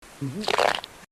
gulp; one piece

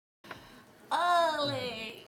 oleeeeeeeee

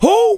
whoo; snoop

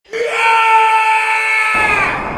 yaaar; meldo the dwarf

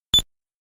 shot recoil; mega man

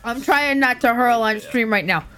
hurl

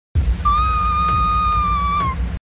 screaming

